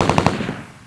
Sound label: Machine Gun M-14; 1,000 Sounds (8-bit); Beep Sounds; Guns